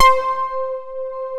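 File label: POLY PLUCKS, SYNTHS; Pro-Rec - Synthsonic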